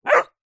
animals dog puppy several weeks old single bark ridgeback cross bullmastiff